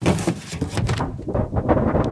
metal wiggle; Sound Design Tools; Raw Foley

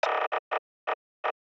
Tech, Weird Side Samples

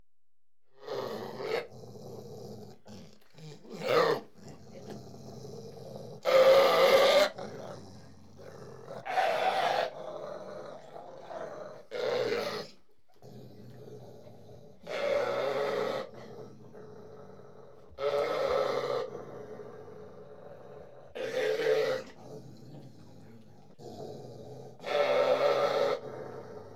Springer Spaniel Dog (About 9yrs); Horses & Dogs; BBC Sound Effects Library

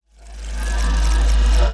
Movements; 99Sounds; The Weird Side Samples

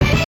jingles HIT; Kenney's Sound Pack; Music Jingles

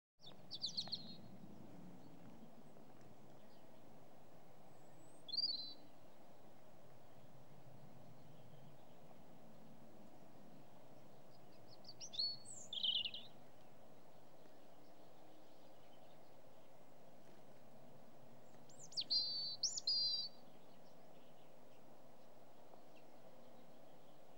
Robin's Song; Animals and Birds; BBC Sound Effects Library

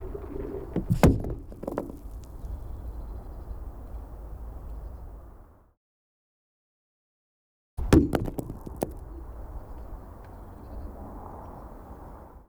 Ice, HIT, Frozen Lake Surface, Throw Stone, Wide; MKH8060

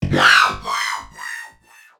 digi hit; 3maze; IMPACTUS

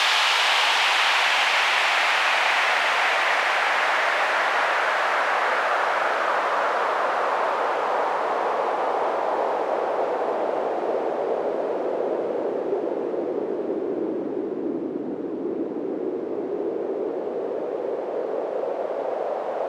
Transition Sweep, White Noise

